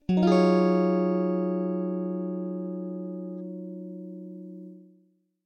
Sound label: guitar